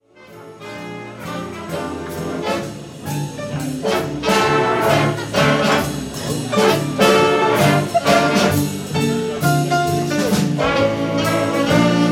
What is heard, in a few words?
swing